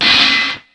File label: Open Hat, Ensoniq ASR-X Pro